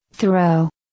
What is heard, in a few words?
throw